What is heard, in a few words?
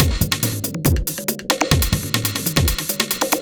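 industrial 140 kit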